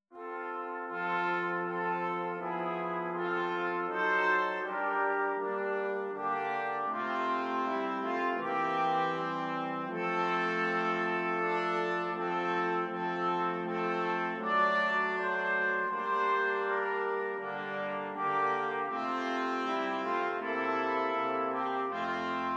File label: Brass Quartet; o little town of bethlehem